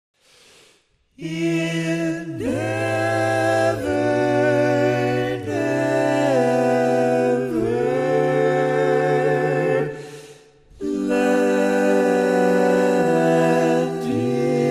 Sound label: Bari, barbershop tags